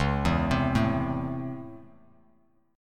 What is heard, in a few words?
C sharp Minor Major 7th Sharp 5th C sharp Bass Standard; C#mM7#5 arpeggiated; 9,7,10,2; JGuitar